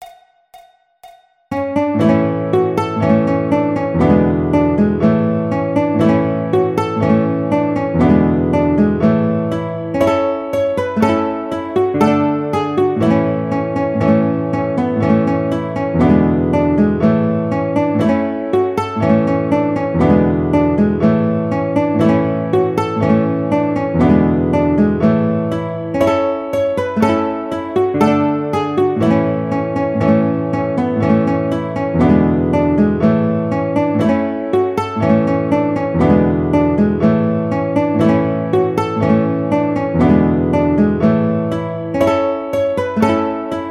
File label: Guitar